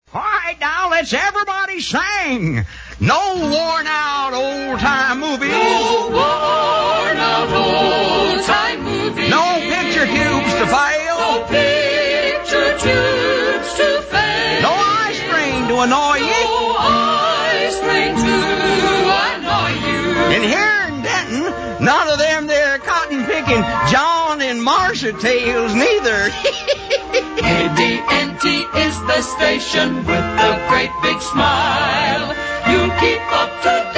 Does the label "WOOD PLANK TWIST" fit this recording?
No